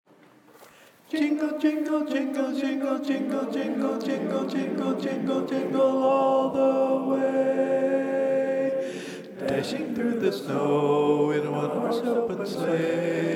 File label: Jingle Bells Lead Chorus; Christmas show